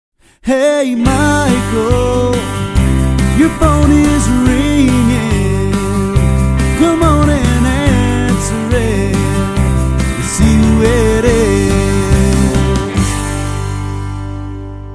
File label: Ring Tone